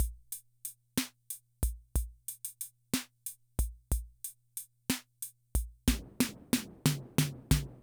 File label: Loop, rhythm 55, classic machines